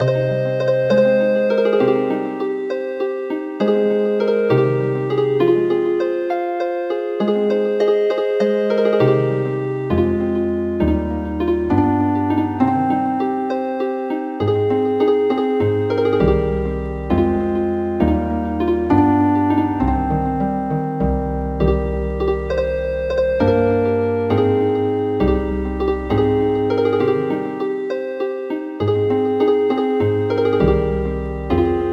Healin Harp

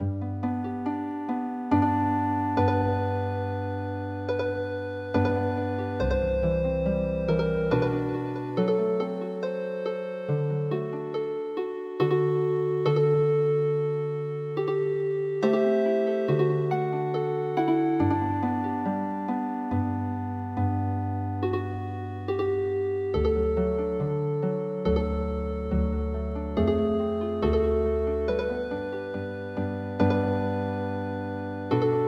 Chilean Healing Harp; midi; traditional

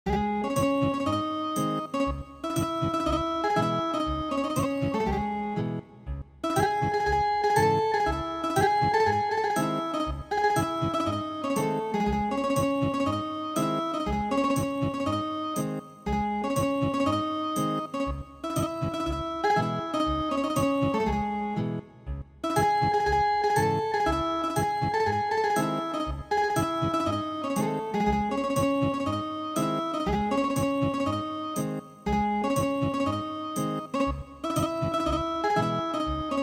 makkuro Ethnic Guitar

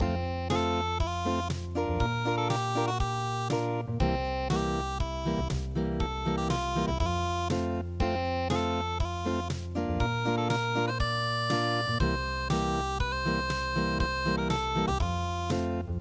unpluged

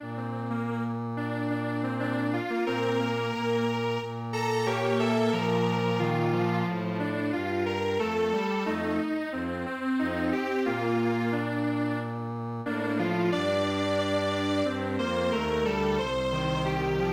Strings Quartet

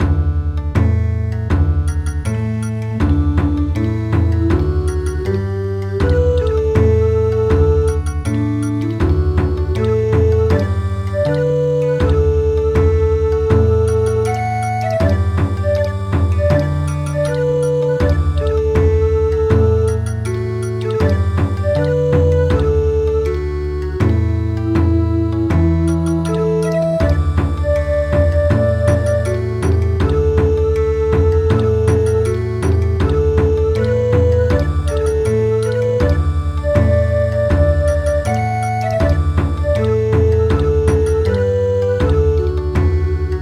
Bon Odori, midi; SHIRAKUMO NABIKU

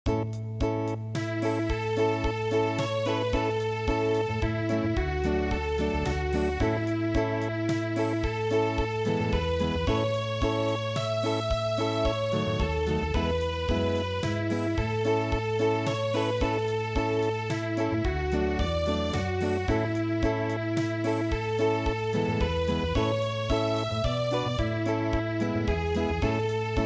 Acoustic

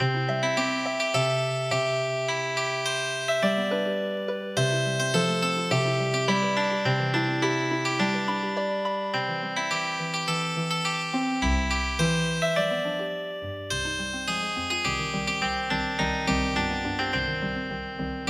Healing Harp, midi; Oyamano Taisho

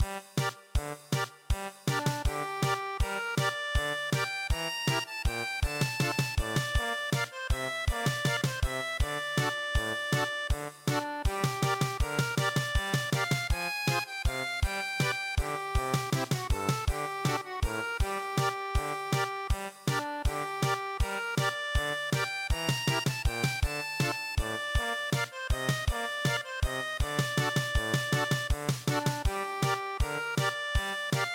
German Polka, traditional

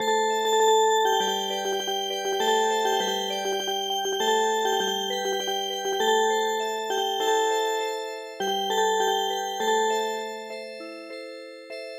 Music Box, traditional

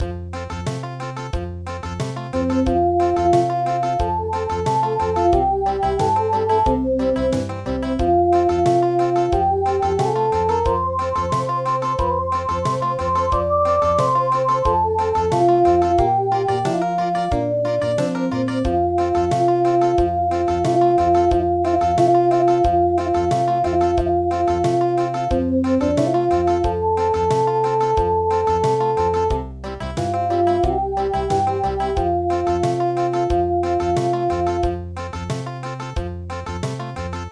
jennijenkins Country

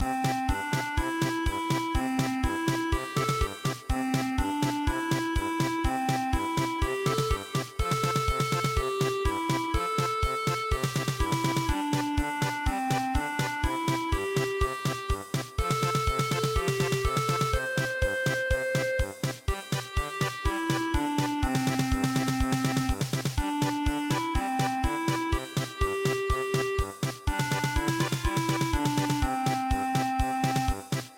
German Polka; Pondan Ponda

